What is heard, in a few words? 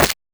Explosion Transient; Go Record